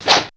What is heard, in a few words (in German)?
PEITSCH, FX PERCUSSN